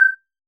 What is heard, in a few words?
Button